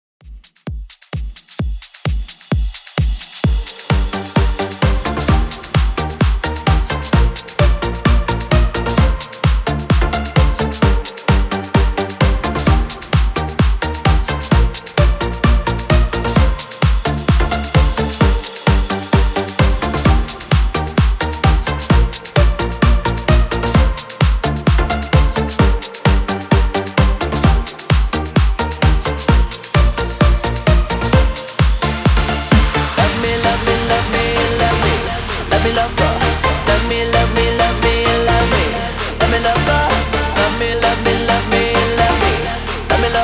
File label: EXTENDED 130 BPM; REDRUM